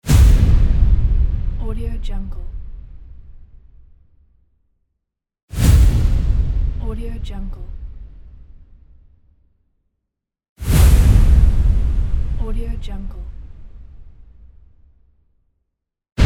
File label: trailer accent; gamechestaudio